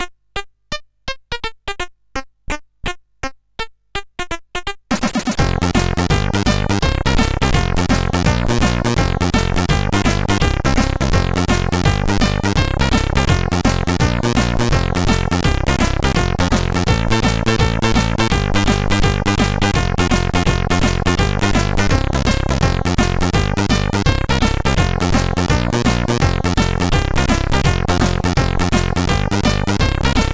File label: Funk sid CSG8580R5; Liket; Bamse-Funk